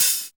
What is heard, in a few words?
HH, Real